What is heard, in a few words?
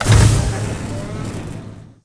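elevator exterior door open; doors elevators; Star Wars Obi-Wan; XBOX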